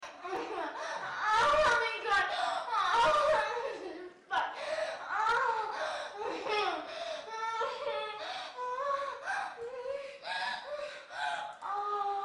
moan

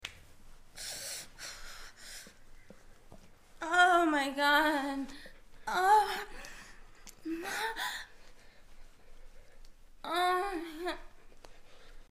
moan

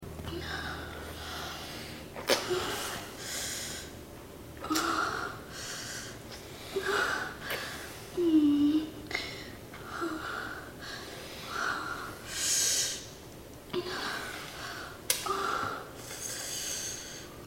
moan

